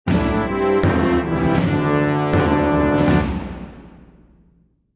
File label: Rome Music Short; Civilization Revolution; Xbox